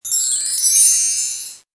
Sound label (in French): son roll gomme; MOBICLIC 84; BIDULE